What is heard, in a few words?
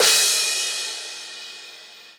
Crash, Cymbals